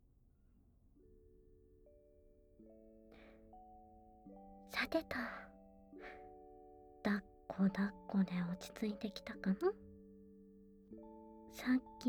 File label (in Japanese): お耳ふーふー BGM＆効果音; BGM＆効果音あり; 授乳オナホコキ お耳ふーふーでお漏らしちっち